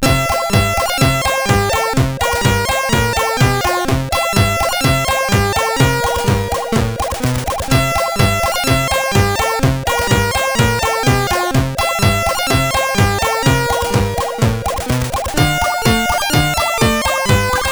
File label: sid CSG8580R5; Fiendish Freddys Big Top O Fun